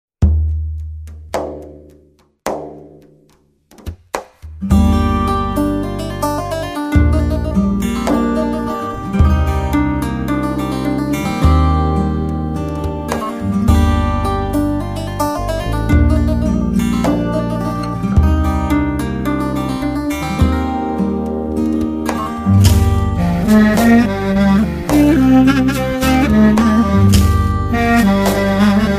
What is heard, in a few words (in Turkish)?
Saz Zil Sesi